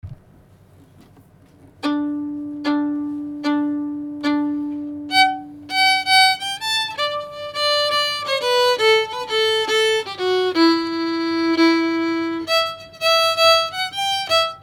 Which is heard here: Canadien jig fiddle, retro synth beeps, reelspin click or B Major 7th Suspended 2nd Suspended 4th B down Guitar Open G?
Canadien jig fiddle